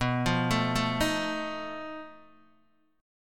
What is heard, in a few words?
B 7th Flat 5th B Guitar Open D